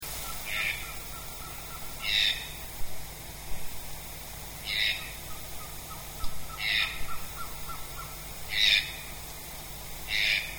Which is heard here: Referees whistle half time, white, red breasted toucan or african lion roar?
red breasted toucan